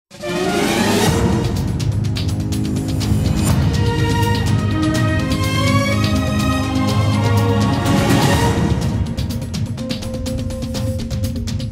Générique JT; TF1 LE20H FICTIF

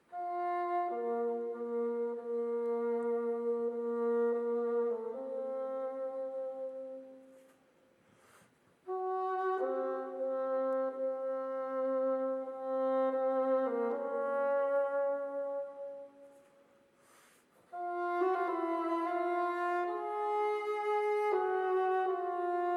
basson

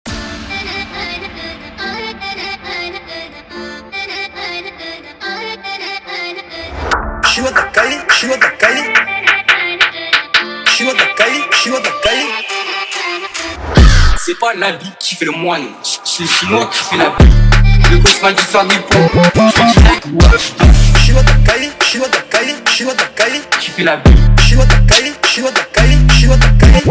beats chinois drill remix

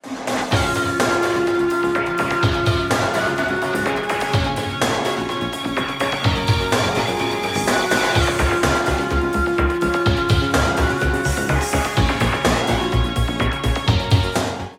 Accords

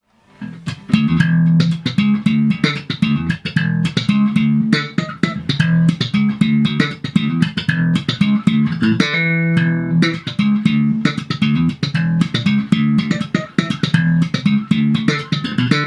basse electrique

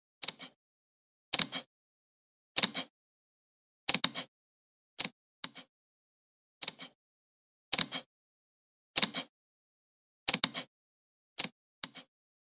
bruitage appareil photo

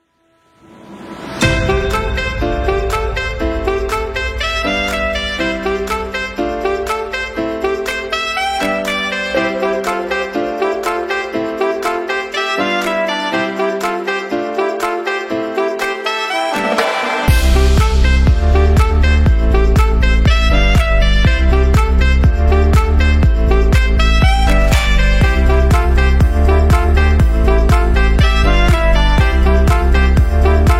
Musique libre de droit sans parole; pour montage vidéo YouTube

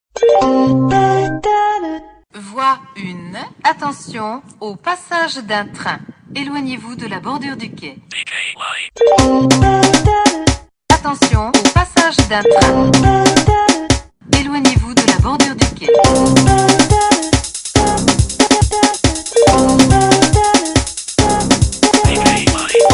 Sncf Remix Coupé décalé

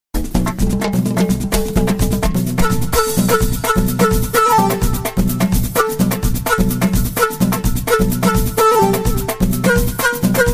Chaabi Ambiance cha3bi Nayda شعبي نايضة

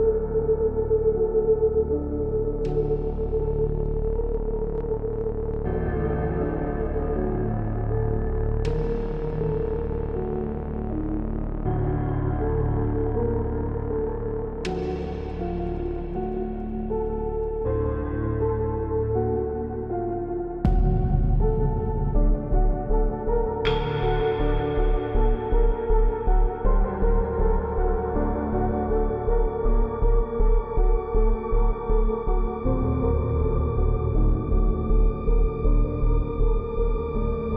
musique ambiance; SCP Short Film

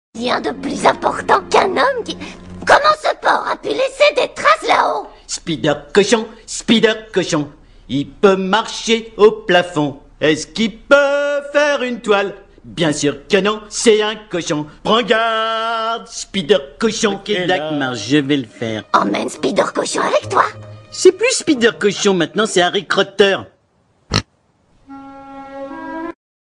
spider cochon

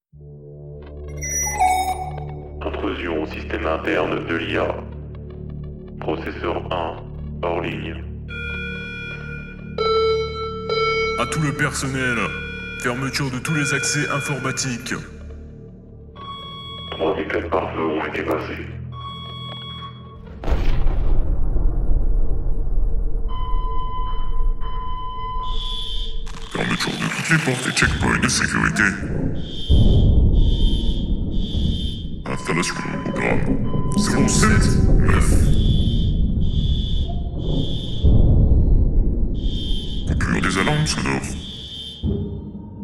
Alarme SCP; Brèche de SCP 079

